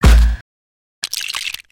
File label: on death, creatures organic